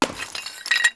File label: vase break; dota; dungeon